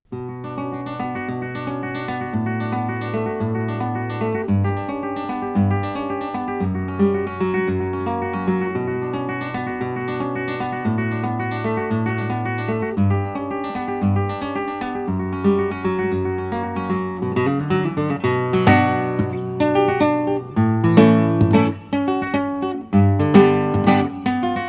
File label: Rumba Rio rumba